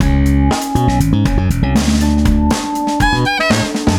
Jazzy, ringtones; Google; NewWave Labs

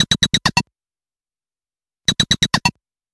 squirrels, ringtones; Nothing Phone (1)

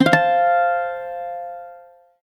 Harmonics, notifications; LG G3 Screen F490L

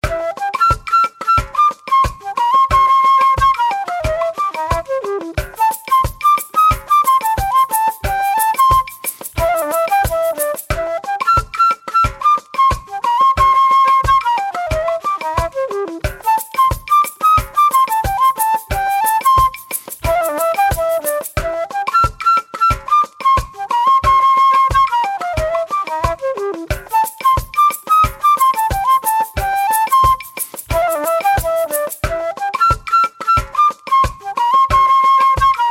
Latin, ringtones; Yezz; Max-1

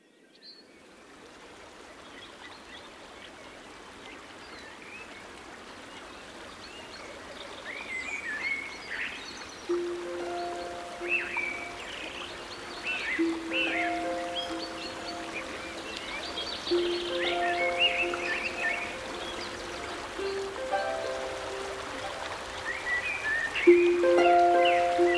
Forest Melody, alarms; Huawei Y6s

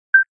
Beep

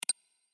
screenshot; Xiaomi Mi-CC9

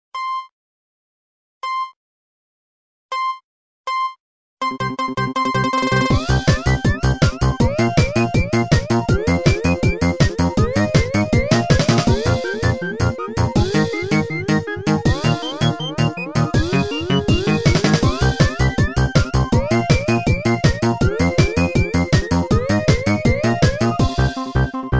Nokia Tones; Windows Phone apps

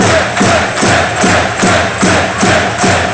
stadium; Philips; Multimedia Studio

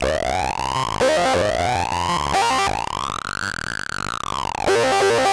noisy; Google; NewWave Labs

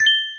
Video Record, ui

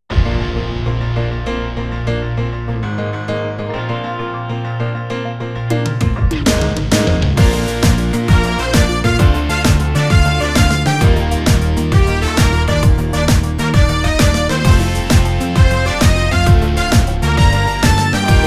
Tween Jump; SE Cool Tween Jump; Sony Ericsson; PlusFourSix

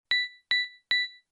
Low Batt; LG B470

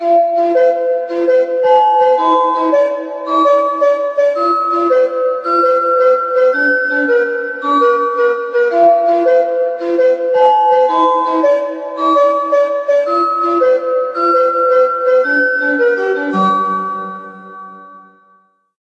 pond, alarms

